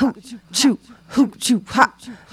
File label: Vocal Echoes